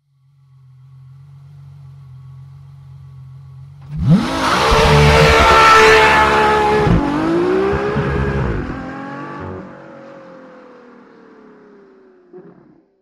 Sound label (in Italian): F150 Partenza sgommata; Fiorano